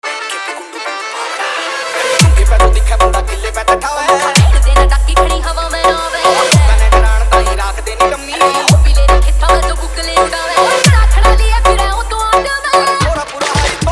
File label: Full Hard Punch Vibration Remix